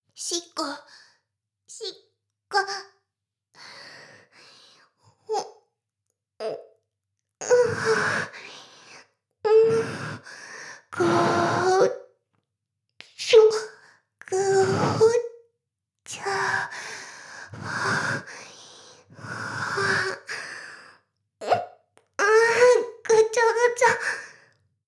しこしこ; 全妹計画２; メスガキ妹; 全妹計画<オール・シスターズ> 2妹め

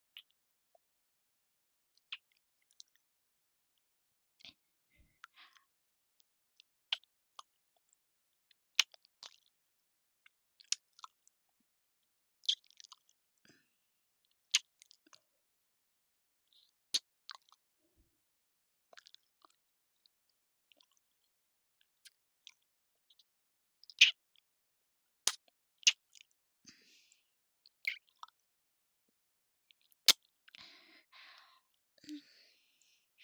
キス; 【マゾハーレム】

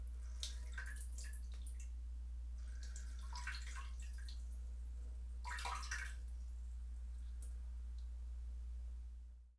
おしっこ 声無し ちょろろろちょろろろちょろろ; 少女の寝起きおしっこ一番搾り; バイノーラル

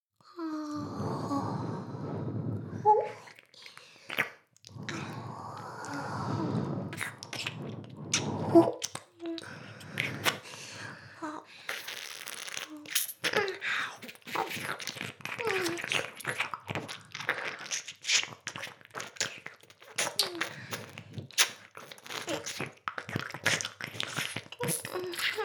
左右同時耳舐め Mix, ASMR; 全妹計画２; メスガキ妹

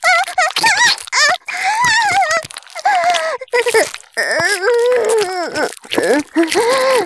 絶頂 中出し; 絶頂+潮吹き+中出し ランダム再生

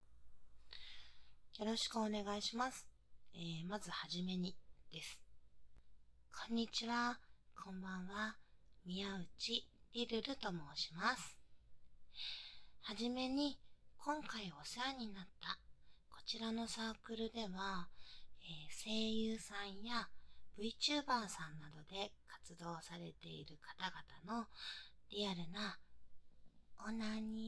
ノイズ除去, はしたない声を我慢できなくなってしまうGカップ巨乳でラフモデルのオナニー実演

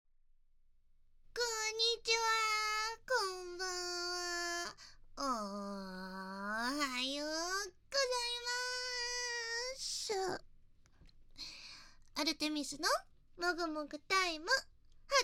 ラーメン もぐもぐ ASMR 3Dio; アルテミスのもぐテミス

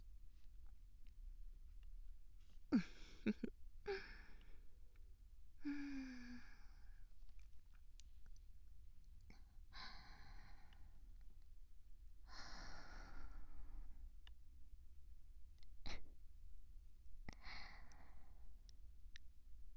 キス; マゾハーレム

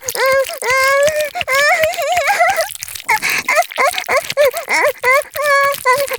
潮吹き; 絶頂+潮吹き+中出し ランダム再生

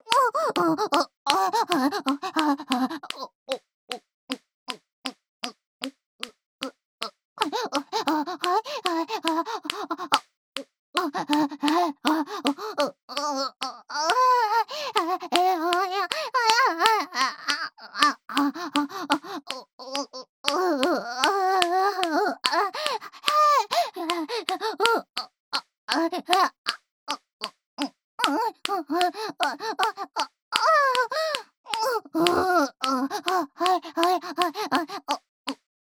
オホ喘ぎ; ソフィ

